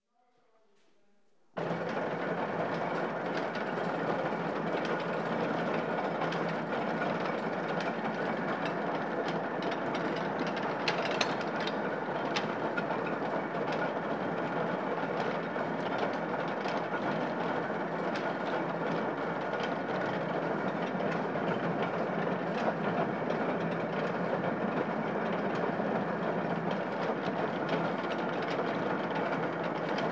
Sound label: batching and softening machine